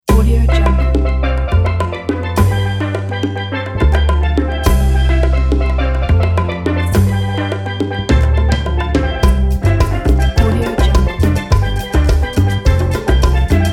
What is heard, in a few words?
paradise beach steel band; eduardoron